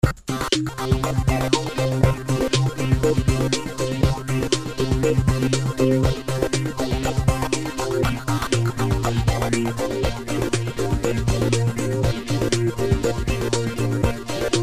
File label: arp